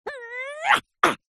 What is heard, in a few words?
cartoon character vocalisation high pitched fight grunt